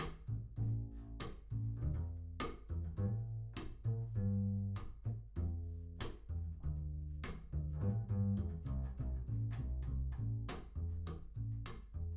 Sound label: Voc Omni